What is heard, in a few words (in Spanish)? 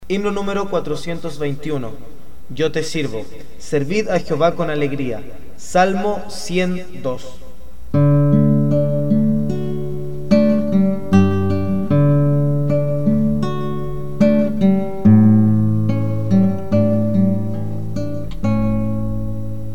Yo te sirvo melodia palabras